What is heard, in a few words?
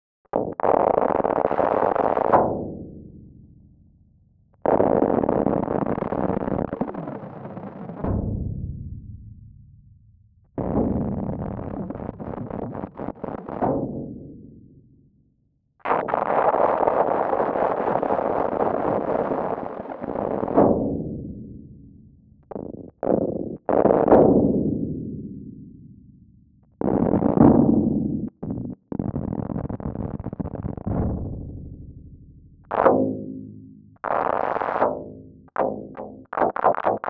String Studio VS3